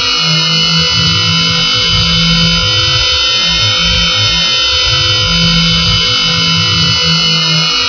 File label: psychomotor loop; ambience